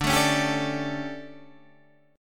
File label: D Major 7th Suspended 4th, Guitar, Standard; DM7sus4; strummed; JGuitar